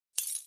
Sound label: imp bullet lightballistic solidglass debris; Titanfall 2